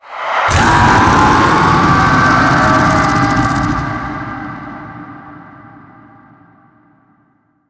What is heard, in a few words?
Roar Death; Sacrifice Memory Four; Umbra; Isaah